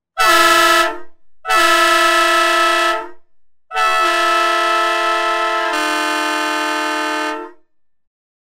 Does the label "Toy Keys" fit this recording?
No